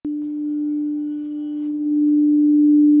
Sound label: singing bowl